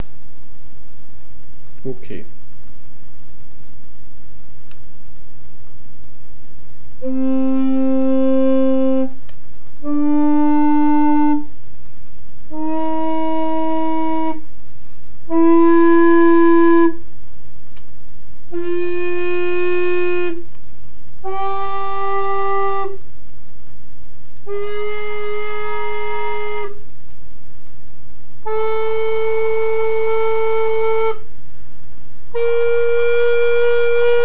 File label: Tenor flute